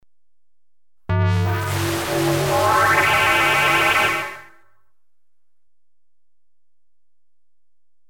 Electronic pipes dropped, Electronically Generated Sounds; Sound Effects Library